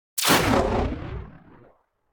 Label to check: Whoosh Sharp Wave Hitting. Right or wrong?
Right